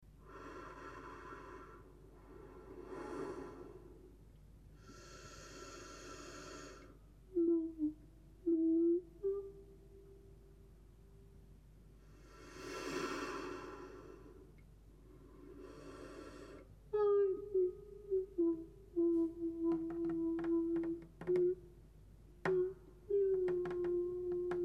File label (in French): souffle; touchers de la harpe